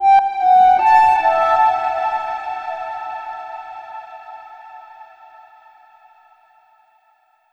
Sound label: Gridnode Overlay Tone; Downtown